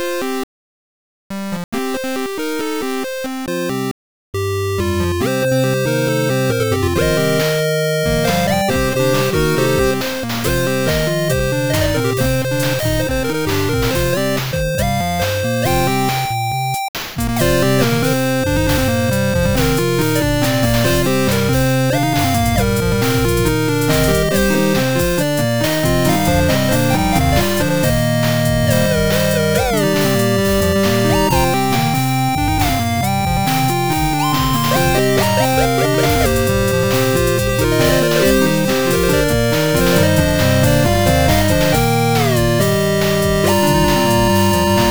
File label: 8bit